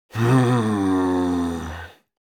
human vocal male grumble bored; smartsoundfx

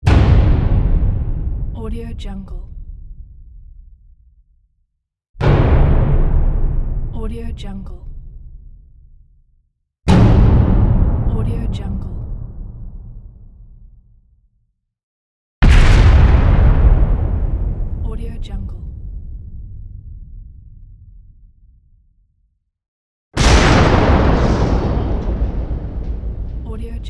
big impacts and explosions pack

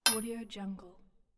small metal hit; stormwaveaudio

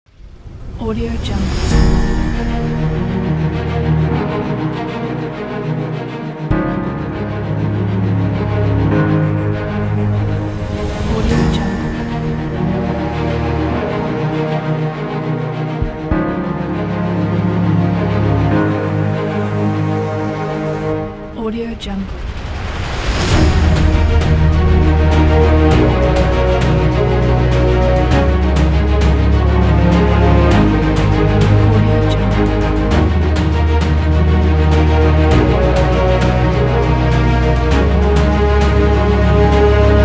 the epic

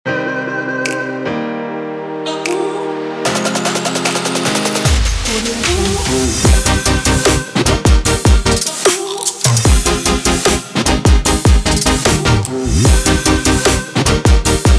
future bass

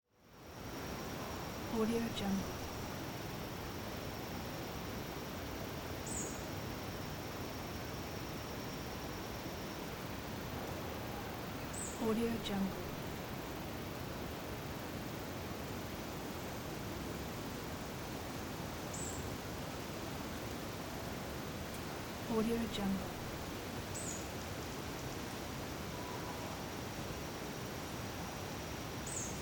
quiet daytime forest ambience wind gusts

